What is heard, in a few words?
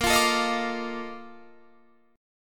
Bb Major 11th Bb down Guitar Standard; strummed; JGuitar